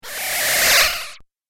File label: Strange Suction Swoosh; Whoosh Sound Effects; Andrew Fleming; Twistedtracks